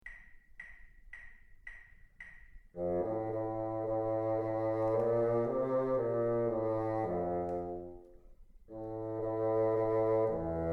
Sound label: teacher, bassoon